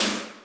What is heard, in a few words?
Little Metal, Alesis D4 drum machine